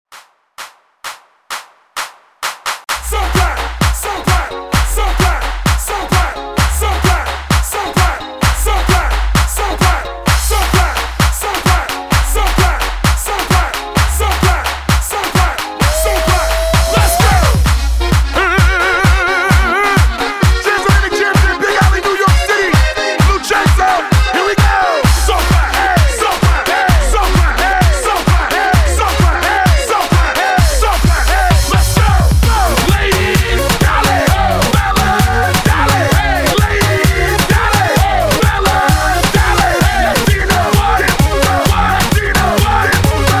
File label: Kuduro Party Starter 130 BPM